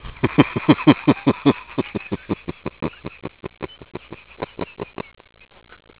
hehehe

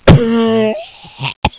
cough

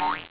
bug

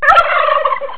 gobble

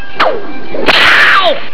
whip

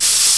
SPRAYCAN

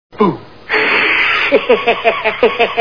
boo!

